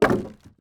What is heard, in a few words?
environment object drop wood